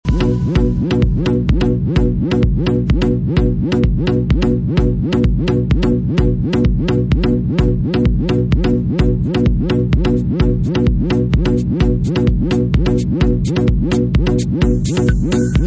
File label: bassline; reese